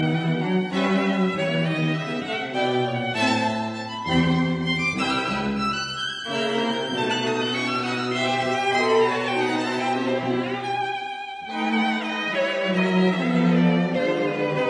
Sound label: String Quartet in E minor Adagio Allegro appassionato; Bridge; Bologna